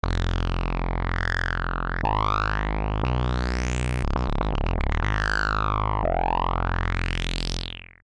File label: evo sub